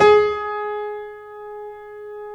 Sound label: STEIN G#3, STEINWAY GRD; Greytsounds VOL-1